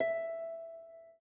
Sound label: Harp, notifications; OnePlus 11